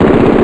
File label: machine guns; BOMBER III™ (DEMO)